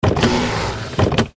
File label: Sci Fi Robot Machine Servo Motors; Sci Fi Robot Machine Movement Servo Motors Science Fiction; Robots